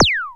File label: Roland lick; Drums